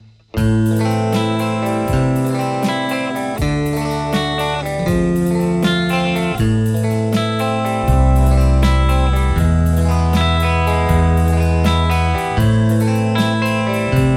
lespaul JCM800 hot; mercuriall

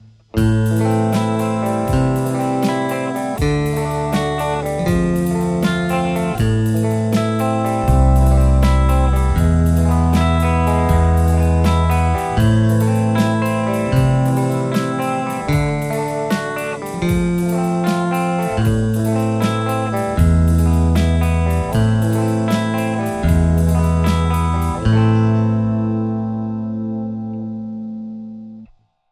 lespaul harlequin; mercuriall

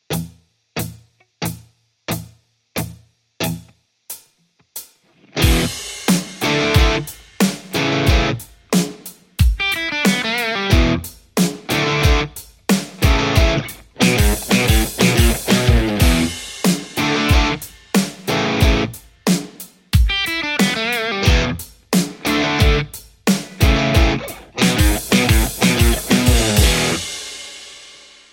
strat lextac; poulin

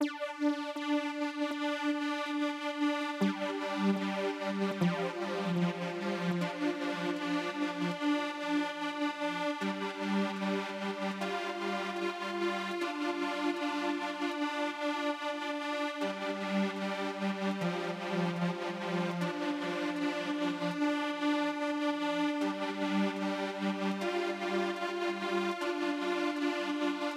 synthe microtrance track

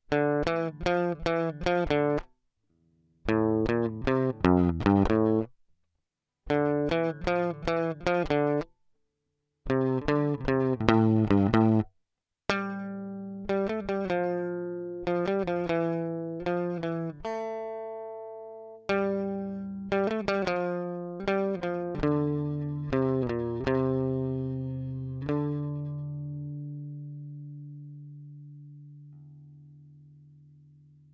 life g1 solo brut

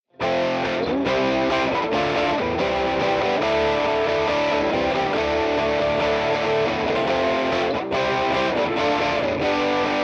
808 chords vintage; ampsims; tse

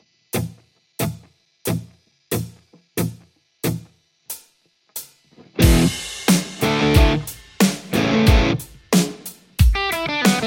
lespaul lextac; poulin

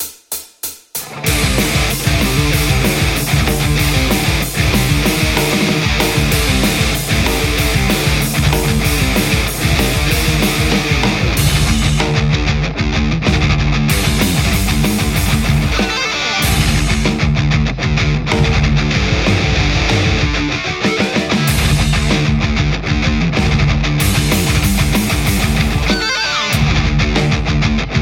metal Les Paul; tse

